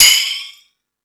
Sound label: Space Drums, E-MU Pro-Cussion; drum machine